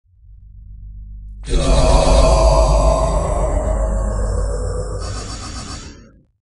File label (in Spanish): oscuro